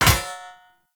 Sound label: Short Sword Medium Parry Impact; Weapon Impacts; Player; Swords; Combat